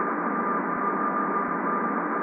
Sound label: cabin